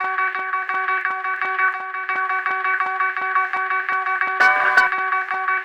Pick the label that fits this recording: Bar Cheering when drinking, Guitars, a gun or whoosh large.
Guitars